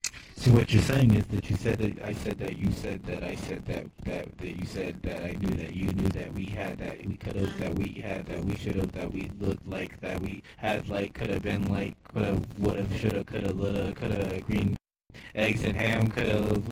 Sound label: slurpy weird